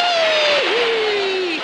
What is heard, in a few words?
wee hee; ooh ahh